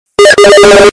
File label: Steam Notification